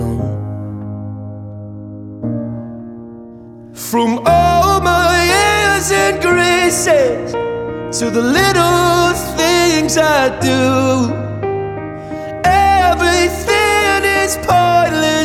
Piano Acoustic

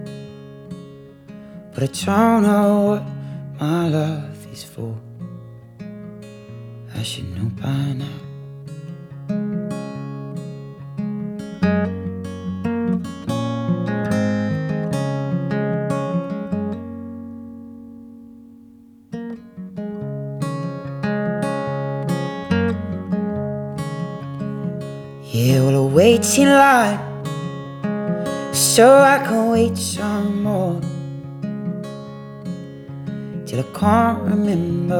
Acoustic

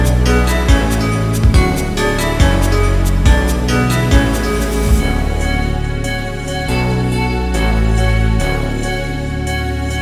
Slowed Reverb

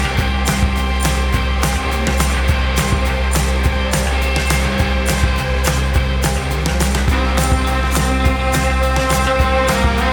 Cello Song

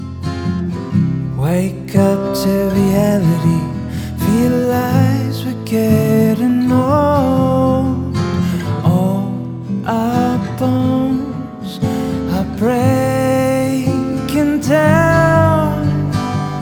Acoustic Version

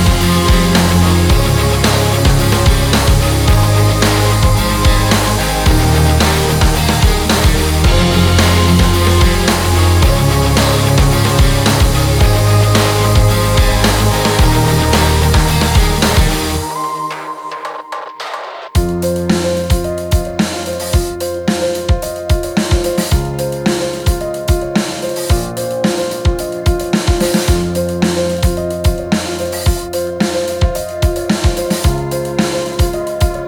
Instrumental

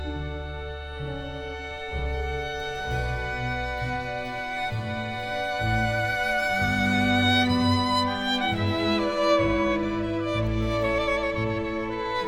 Orchestral Suite; BWV 1068 II Air

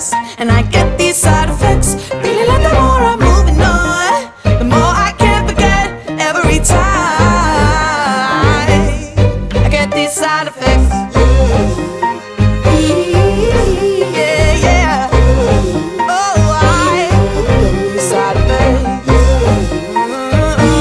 Acoustic

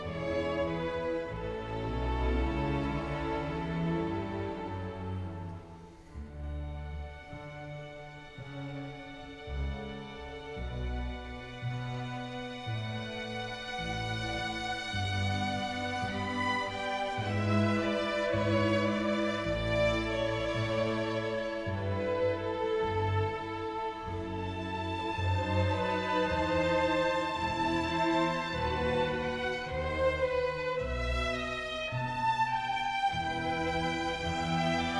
Orchestral Suite, English Chamber Orchestra; Orchestral Suite No 3 in D Major BWV 1068 Air

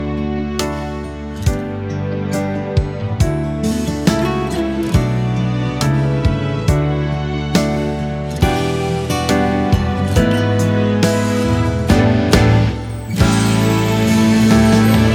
Instrumental

